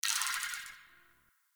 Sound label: Tree Critters; Warframe; Duviri; Ambience